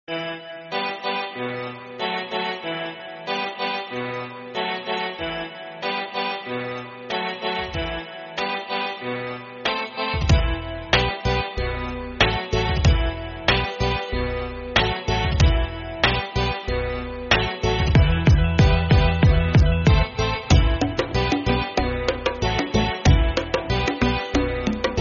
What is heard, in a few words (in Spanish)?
Sarcastica Ironica Jocosa Triste